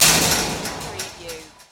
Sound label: Button Sound - Metal Fence Bang